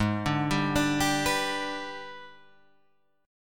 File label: Gsharp Minor Major 7th Flat 5th, Guitar, Drop D; 6,5,5,7,8,7